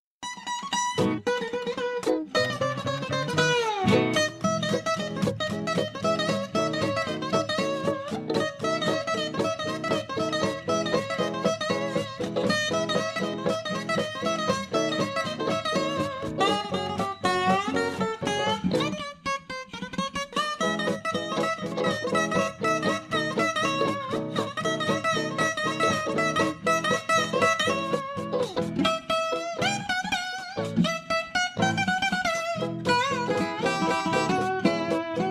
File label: Ukulele; Meme Music; Sound Effect for editing; Spongebob